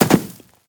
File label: Grass Jump; moul-assets